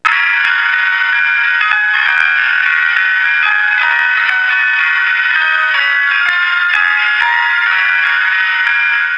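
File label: mel, horn; model 336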